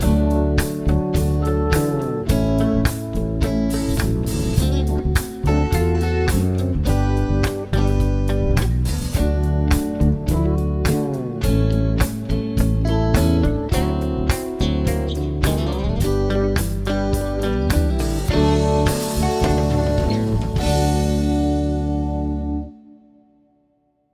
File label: progs with applied chords; harmony